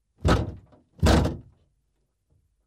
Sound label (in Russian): variant udara cheloveka mashinoj